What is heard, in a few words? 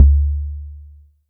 cmos tonal, Kickbank; mpk mini presets; Ableton Foundation FreePacks